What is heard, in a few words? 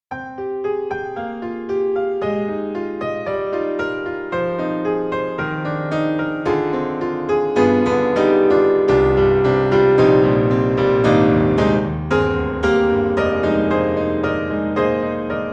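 Model D NY Jazz Club, piano; Steinberg The Grand 3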